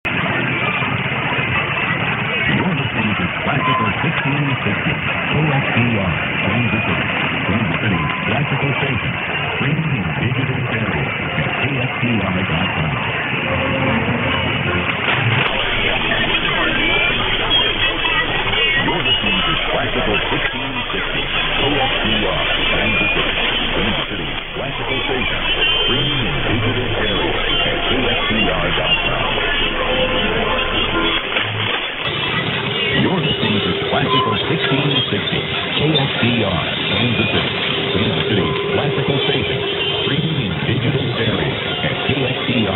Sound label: classical; kxtr